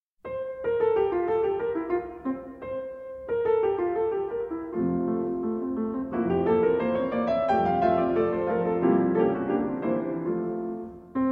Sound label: Piano Sonata in C Minor, 2 Pianos; No. 14; Arr. For 2 Pianos By Edvard Grieg